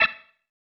Stab, Organ, One Shots

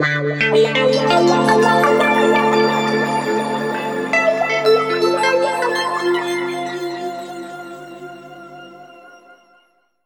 D P-S WA -L, Guitar; East West; Steve Stevens Guitar Samples Collection; PTCH-SHFT+WA

